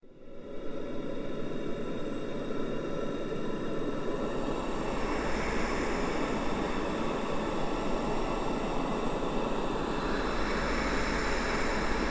Cave Music; children of morta; Harsho Hoorsh

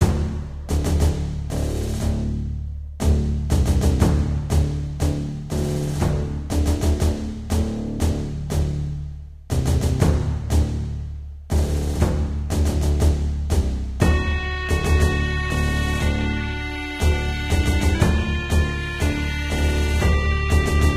sector clear; battlevoid harbinger

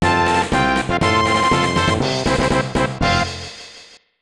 Fanfare; Gokinjo Bouken Tai; snes

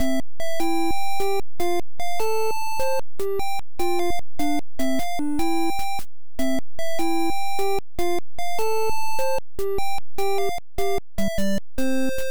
Level BGM; adam eve; nes

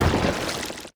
dung defender dive

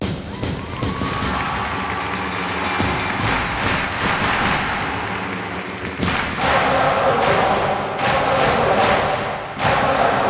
Chants - Asian Type

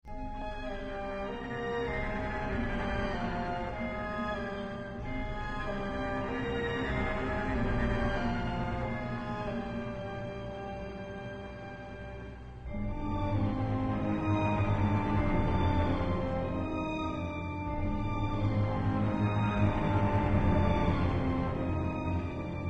Music Explore Low short

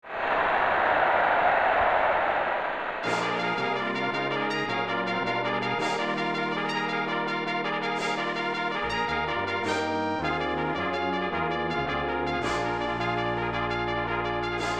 G1 Fanfare; Derby Stallion III; SNES

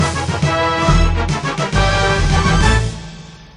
Success In Mission, pinball; Attack From Mars; Bally

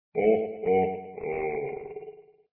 Croc Laugh; Feed Fribbit Colors

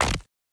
Angel Statue Hit

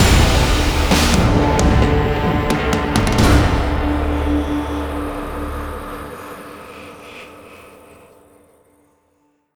Ambient Sting; Prototype

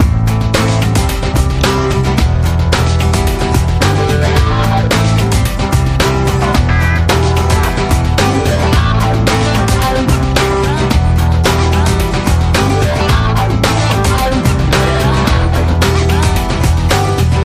Acoustica - Loop

